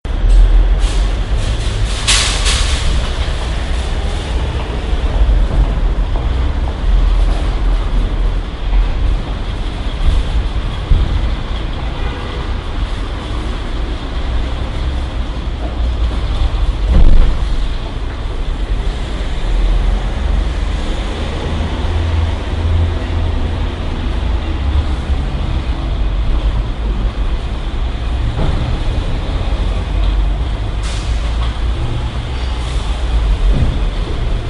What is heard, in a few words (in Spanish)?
coche